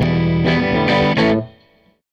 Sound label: Gretsch Guitar Loop; Sonic Foundry (Sony Creative Software); Six-String Orchestra